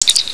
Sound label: Terminal; ComLink